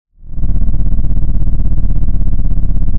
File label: Sound of an engine revving